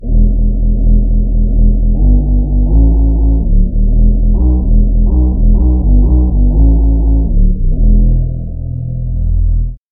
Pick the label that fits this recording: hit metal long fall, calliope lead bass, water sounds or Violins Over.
calliope lead bass